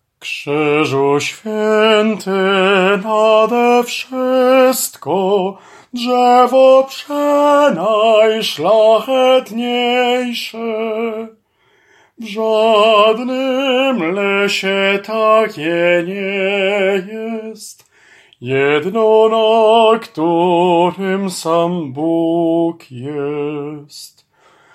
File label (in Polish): SOPRAN; Krzyzu swiety